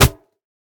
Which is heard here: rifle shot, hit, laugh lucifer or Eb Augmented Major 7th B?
hit